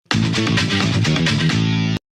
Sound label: hell yeah riff